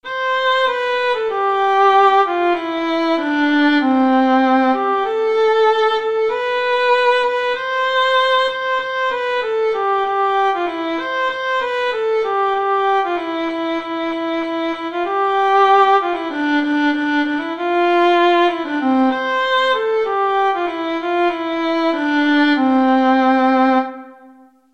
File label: joy to the world, keyboard